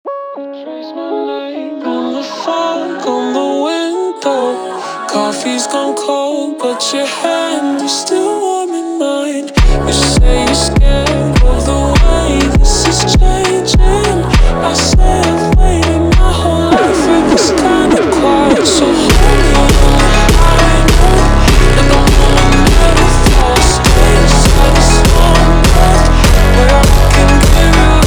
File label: High Note Jumpstyle super Slowed